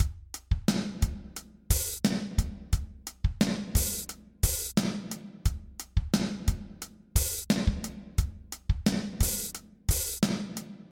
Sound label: loop; steinberg cubase 8